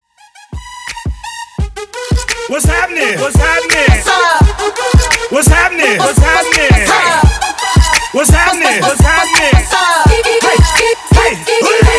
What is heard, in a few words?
Club Edit 85 BPM